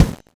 Breath Kick; Sonic Foundry (Sony Creative Software); Drums & Drones; Construction Kits

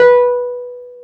PNO, Wurly

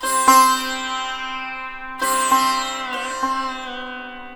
SITAR, Indian Traditions

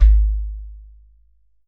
GHATAM

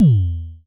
FM Kicks E2, Vermona Kick Lancet; LookIMadeAThing Kicks

